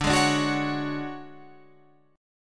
D Major 7th Flat 5th D down Guitar Drop D

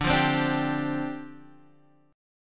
D Minor 11th D down Guitar Half Step Down

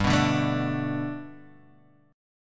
G Major Add 9th down, chord; Gadd9 strummed; JGuitar

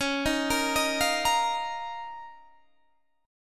Eb 7th Suspended 2nd Db

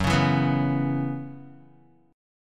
Gb 7th Suspended 4th Gb down Guitar, chord

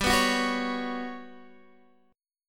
G Major Sharp 11th, Guitar, Open D; chordshape; down

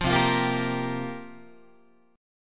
C 7th Suspended 4th, Guitar, chord

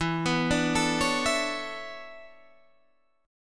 E 13th Suspended 4th

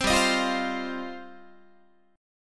G 7th Suspended 4th Sharp 5th, Guitar; Listen to G7sus4#5 strummed; G7sus4#5 Chord

